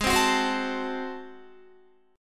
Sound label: G sharp 7th Flat 9th G sharp down Guitar, chord; G#7b9; strummed